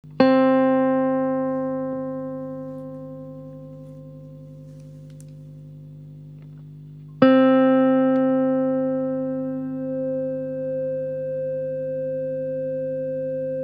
Feedbacker; Ici le feedback est réglé à l'octave

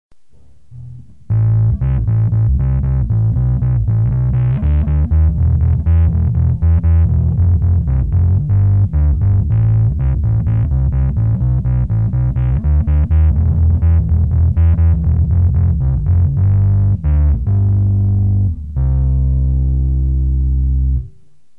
fuzz, Effets bass

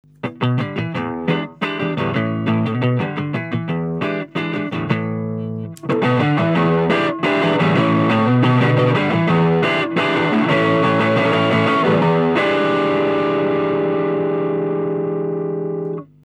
Overdrive, Effets; Ce dossier a pour but de dresser une petite liste des effets les plus utilisés; Le taux de distortion est assez modéré

